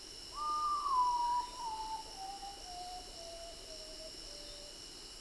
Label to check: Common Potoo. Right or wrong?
Right